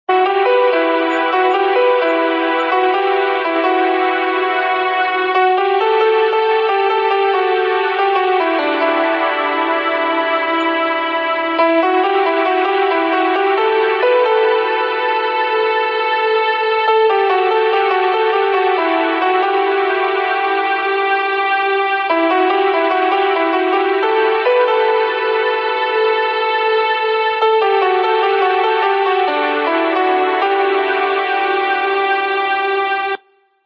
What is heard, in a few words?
Instrumental Song